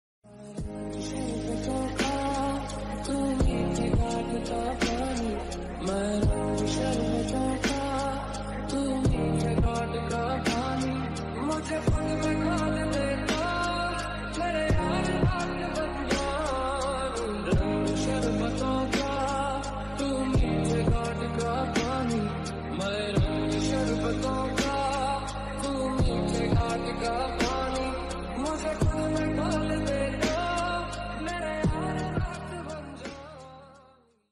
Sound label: hindi ringtone, romantic ringtone